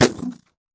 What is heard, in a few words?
tight hit, Tight n Hard Kit; Hip Hop; style kits